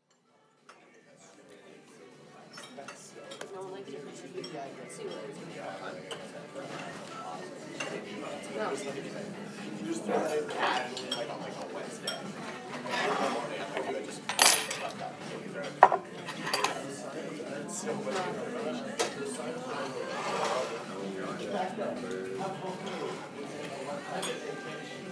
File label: Soundscape